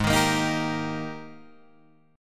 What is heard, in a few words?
Ab Major 7th Suspended 2nd Ab Guitar Open G; 6,8,8,8,8,8